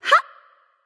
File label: grunt jump light; smite; voicepack; hel